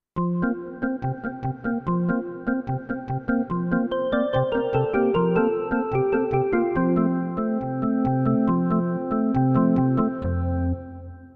Det Perc Organ

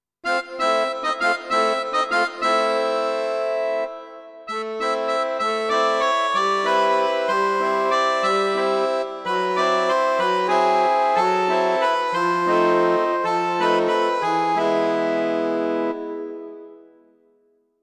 Tango Accord

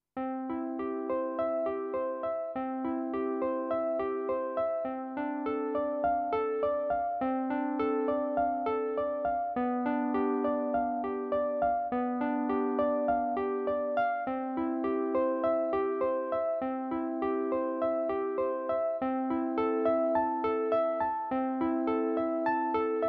Vintage E Piano

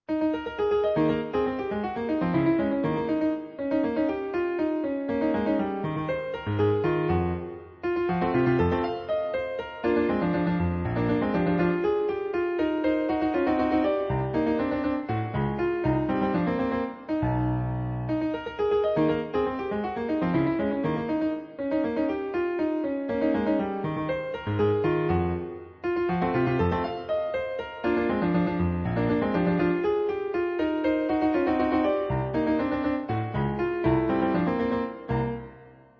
Piano folk

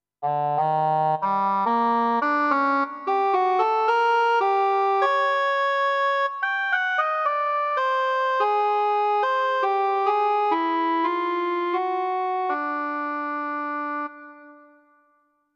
English Horn

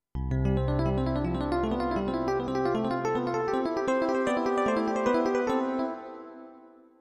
DX EP Hard

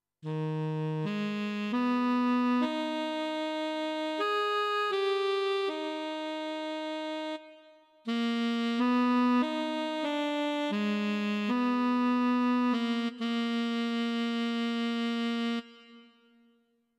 Tenor Sax

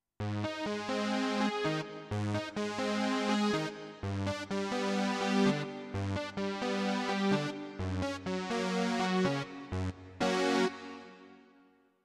Jump Brass

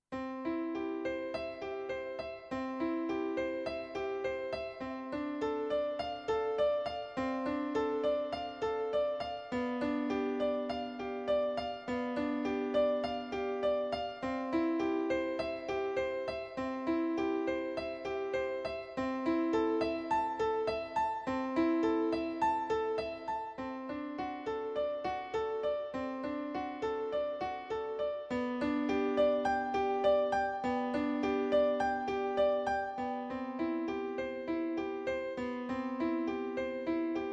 Rock Piano

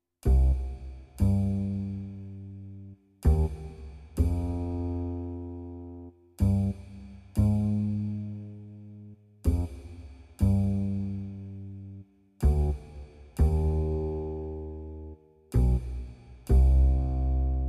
Bass Cymbal

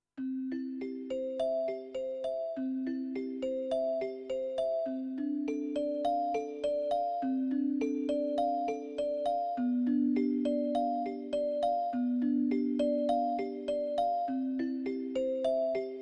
Celesta

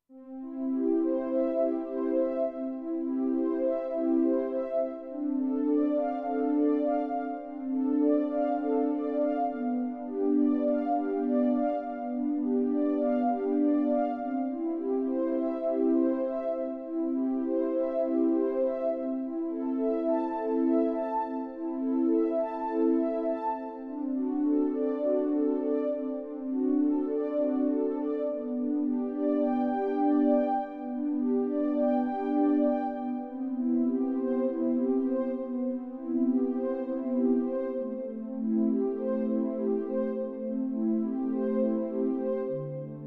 Synth Pad

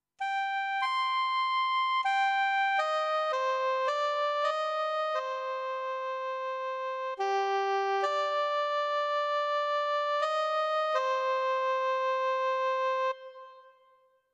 Soprano Sax

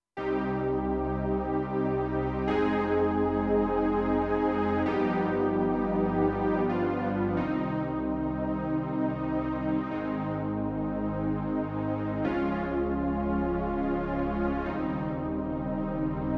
Poly Pad 80

